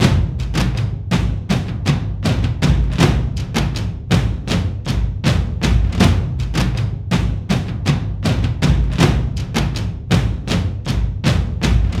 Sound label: Drums; For Honor